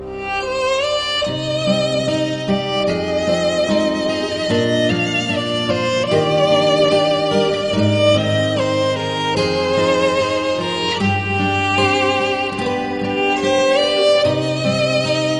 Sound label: Romance On Violin